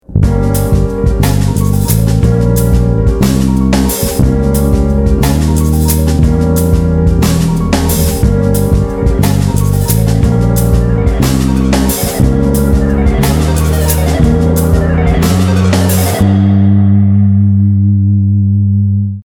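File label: Symmetric diminished whole half groove, bass; a bassists guide to modes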